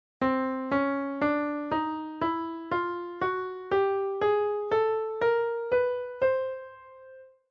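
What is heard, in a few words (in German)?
Tonleiter C Chromatisch; Weitere Skalen; Tonarten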